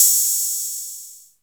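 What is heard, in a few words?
Open Hat, Percussion Hits; Zero-G Jungle Warfare 1, 2 & 3; 90 s Sample CDs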